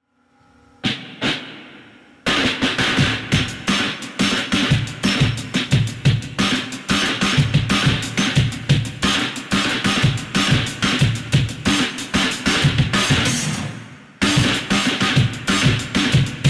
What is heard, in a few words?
drum